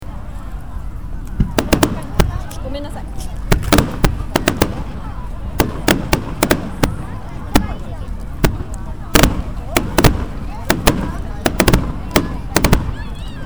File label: fireworks